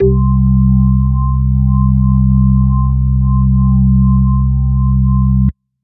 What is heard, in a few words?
ORG (C2); neuro; Stanza